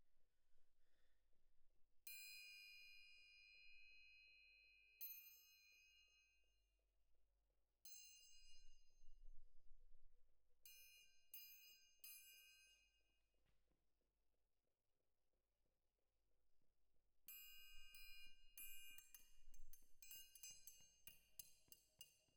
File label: Perc Main